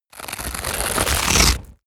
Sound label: tear